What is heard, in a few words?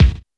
original bass drum sample d key, kick drum sample tuned to the D note